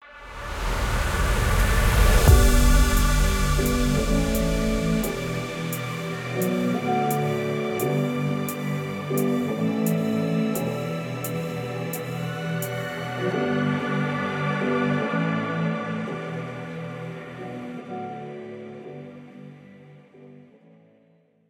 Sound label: mus recall; Smite